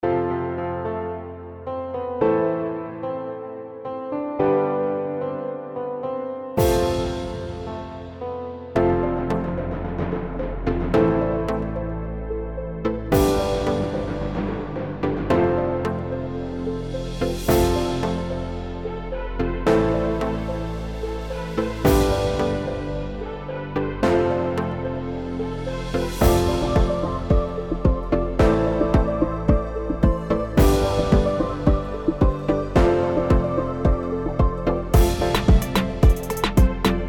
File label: urban reggaeton sample kit